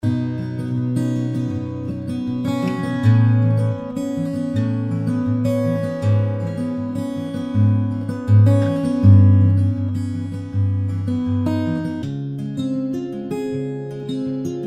Guitar Bits and Bobs

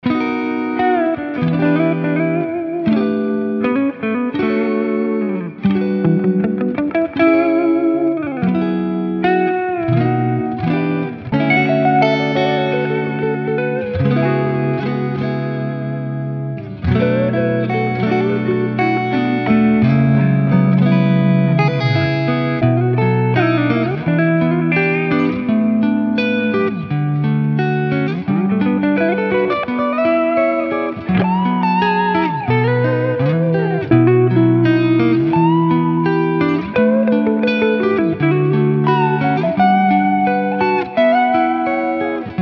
Smooth Pop Guitars

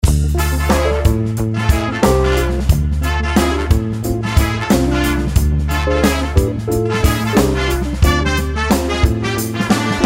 Funk Soul Music

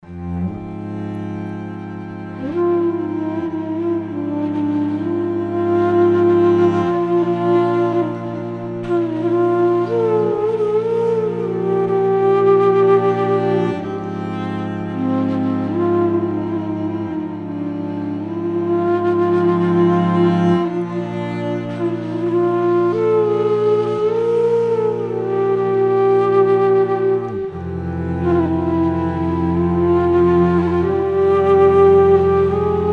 Indian Flute

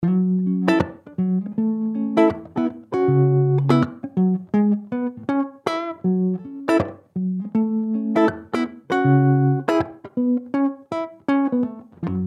Ultra Lush Guitars

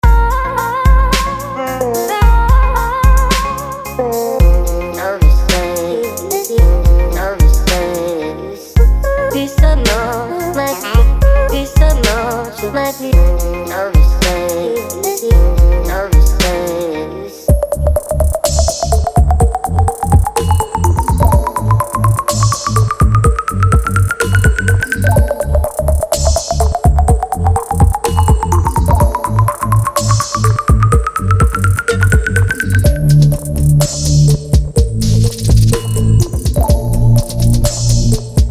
Electro Smooth

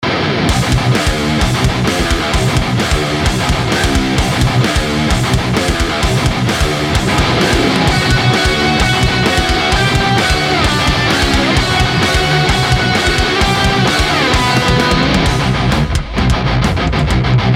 Heavy Rock Guitars

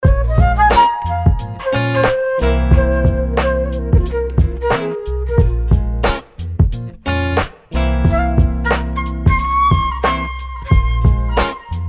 Jazzy Flutes

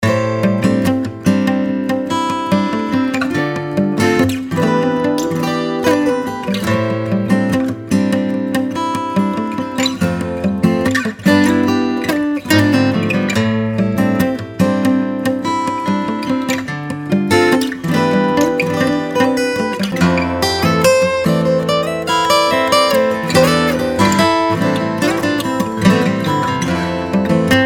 Acoustic Guitar Sessions

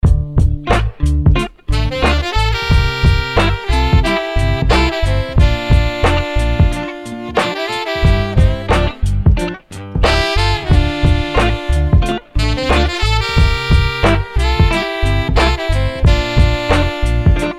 Tripple sax

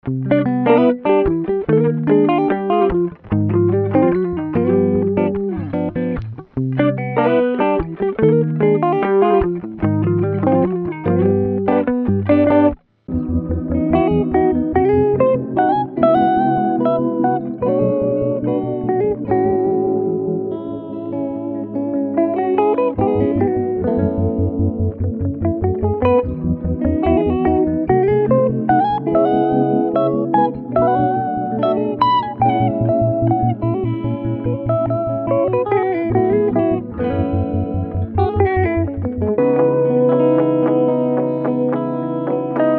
Ultralush Guitars

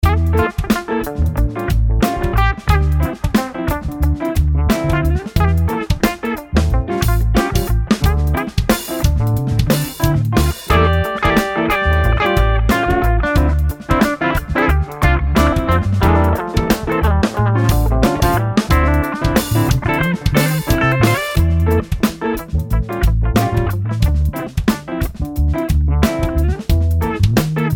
Bass Guitar Grooves

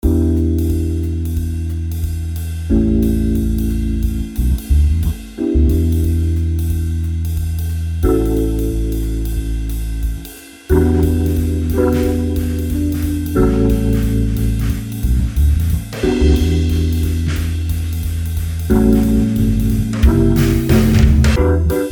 Jazz Components

